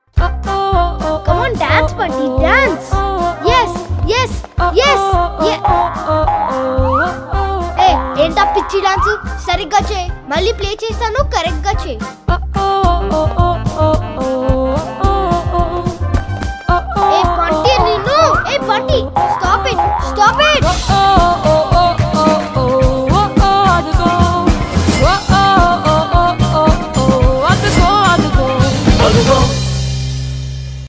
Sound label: Background Bgm Music Bit